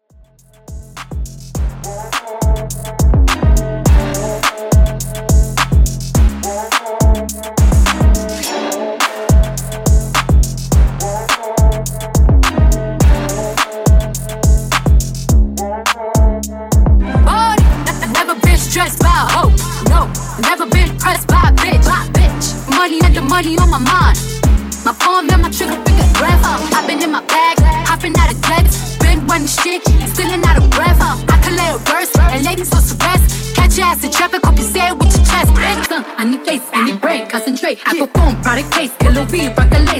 Redrum 105Bpm

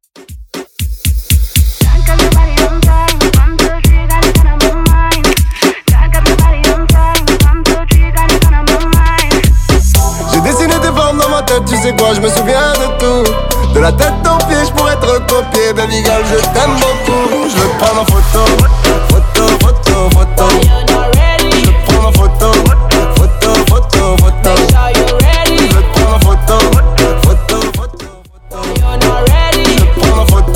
Afrobeat Latin 118bpm

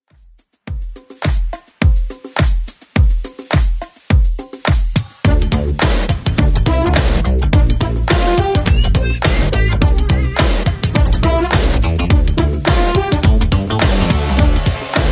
80s Club 105 bpm CLEAN; 80s Club Edit

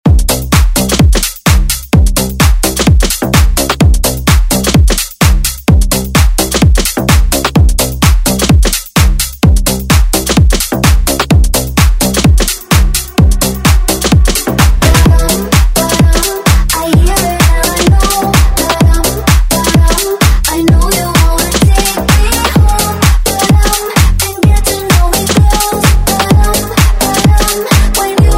House Redrum Intro

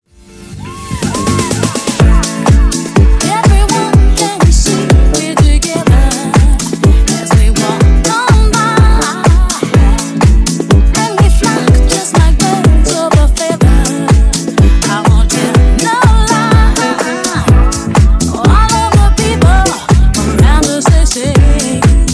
Funk Bootleg 124Bpm

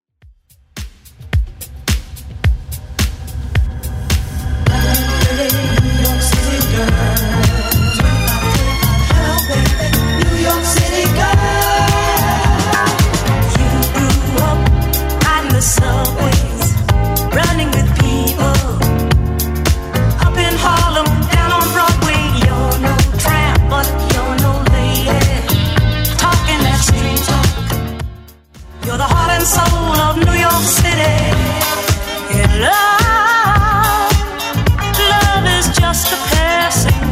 70s Redrum 108bpm

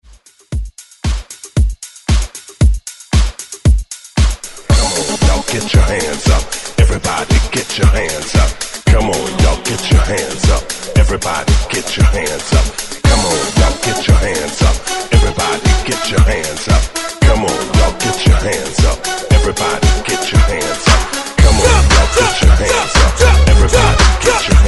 Hype Re Drum 115Bpm; DJDOLLS

